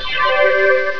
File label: heal; QUAKE